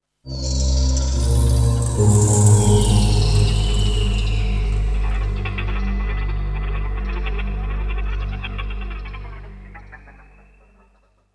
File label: Oneshot Synth; zombie army 4 dead war; ps4 stadia switch windows xbox one